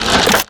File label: weapon pickup